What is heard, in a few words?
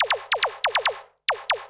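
SNARE ELECTRO 140, Techno Drum; Techno2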